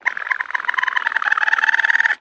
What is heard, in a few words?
Raccoon Chitters, Calls From Baby To Mother